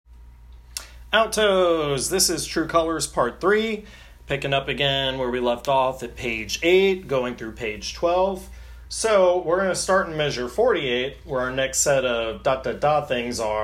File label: Alto